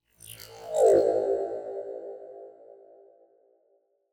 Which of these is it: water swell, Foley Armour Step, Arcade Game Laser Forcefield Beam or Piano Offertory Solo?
water swell